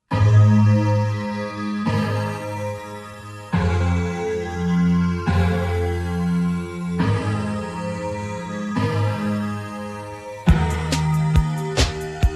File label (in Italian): BASE accordatura standard